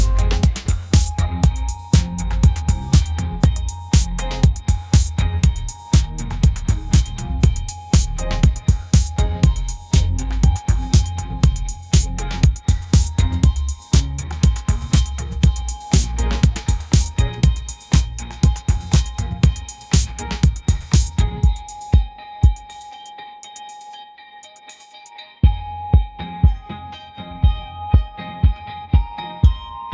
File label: upbeat pop melody; ai-generated